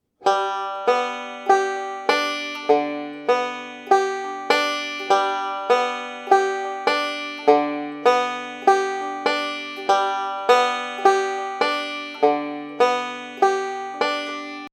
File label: alternating roll